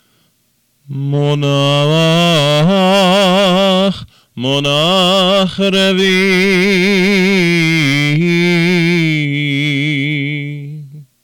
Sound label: Haftarah trope line; Bnai Mitzvah; revii